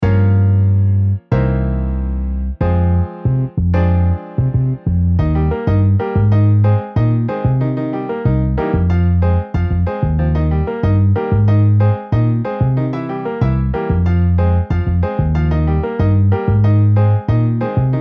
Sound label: Piano Bass MIDI